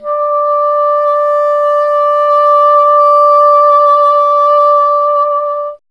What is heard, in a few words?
bassoon D0, Bassoon; Miroslav Vitous - Solo Instruments VOL-1